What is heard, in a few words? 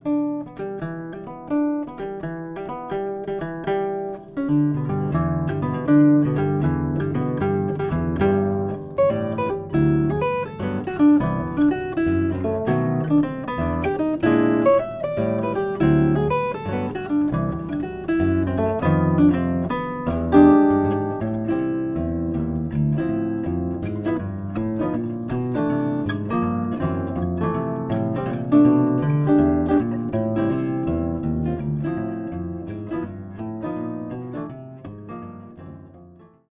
Jazz Exerc, Zither; Jazz Exercise